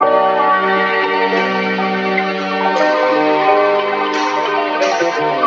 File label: keys hard rock, loops, phonky